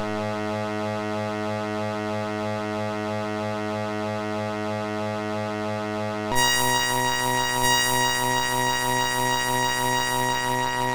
sid, MOS6581R3

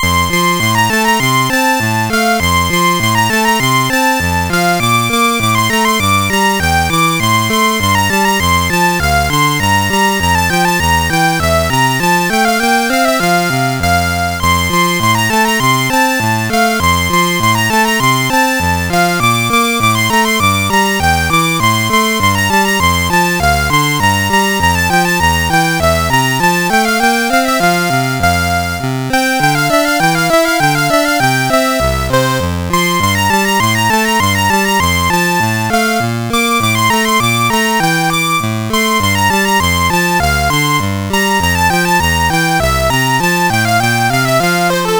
Klavierstck in F, CSG8580; CSG8580R5

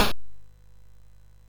sid, MOS6581R2; Dragons of Flame

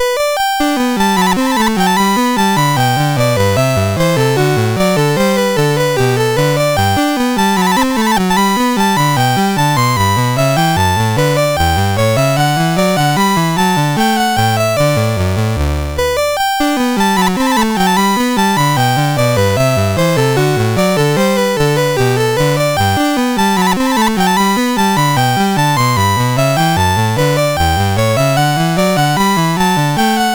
bouree, MOS6581R3; Bouree No1

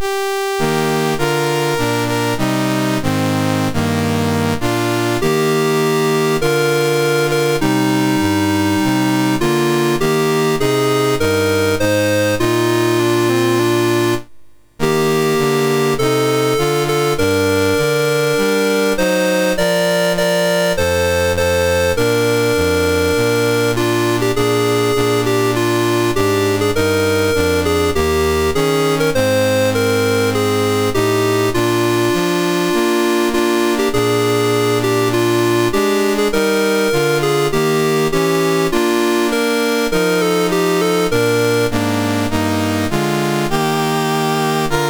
Canada National Anthems, MOS6581R3

